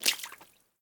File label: step Water